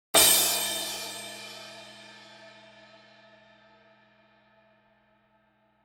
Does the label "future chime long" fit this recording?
No